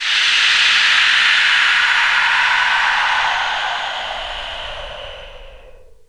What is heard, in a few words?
Downlifter